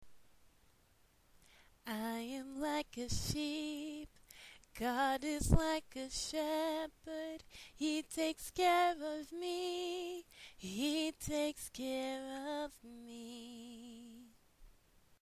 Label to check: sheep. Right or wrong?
Right